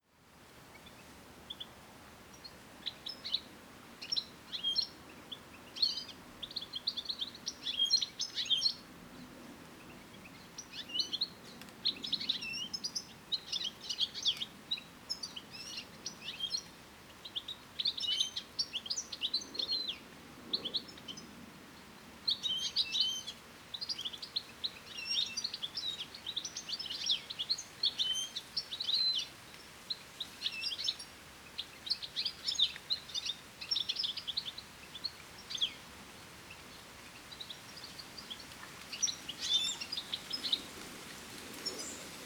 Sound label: Carduelis carduelis; SETE